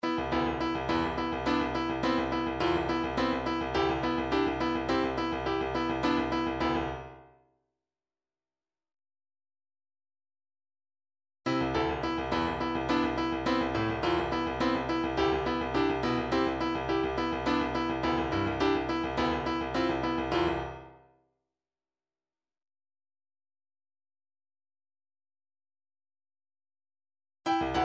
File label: midifiles steady beat 105 bpm; snaz